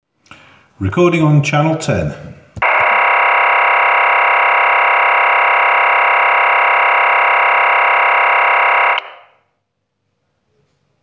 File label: nasty noise